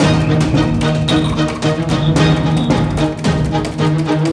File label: escape loop; skytree